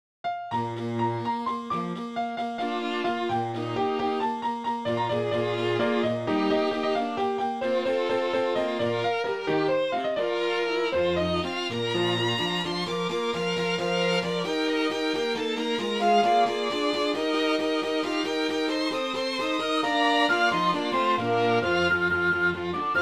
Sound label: piano concerto piano 4 Strings 4 Winds; mozart; a2s pro